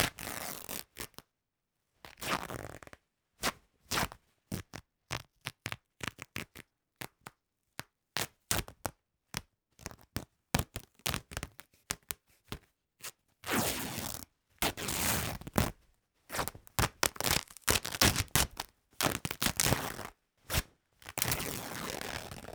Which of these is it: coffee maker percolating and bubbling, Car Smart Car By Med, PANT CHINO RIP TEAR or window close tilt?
PANT CHINO RIP TEAR